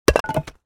wood drop

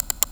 mouse clicks, hardware

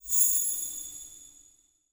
bell chime; belfry

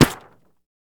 bullet hits paper

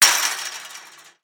fence hit

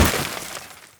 auto glass shatter

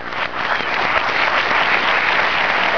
TAPS

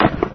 plastic hit; heavy plastic object drop

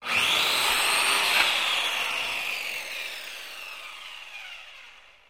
drill hammer power off, rotary hammer

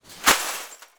glass debris

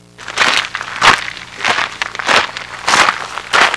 TRUDGING, walk

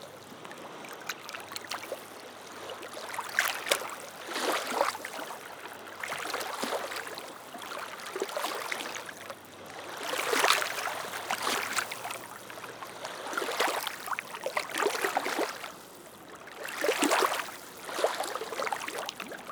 lake waves

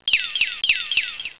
TONE; trills

